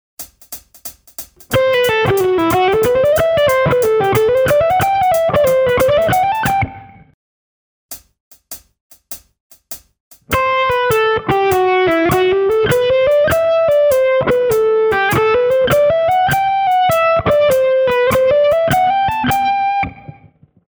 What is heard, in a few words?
legato